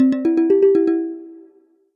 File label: call incoming; tweb-i2p